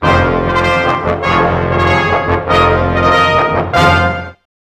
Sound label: horns